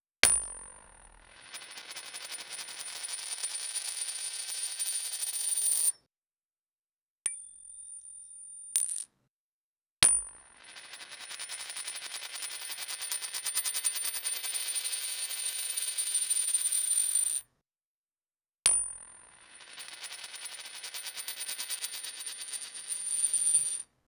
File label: set a coin on a